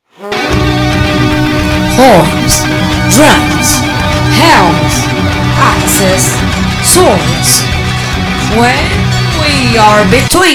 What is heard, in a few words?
Heavy Metal Hard Rock